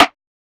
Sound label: rim; FL Studio; xander brown drumkit